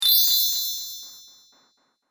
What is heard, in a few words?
High Chimes Up Delay; Chime Sound Effects